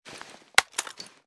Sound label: ar akilo47 reload grab; weapons; viper